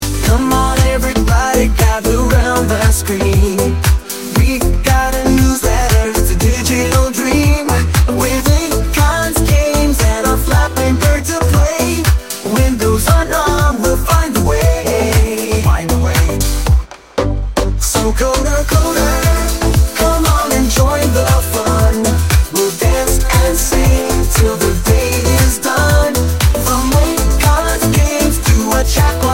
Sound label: Groove; The So Coder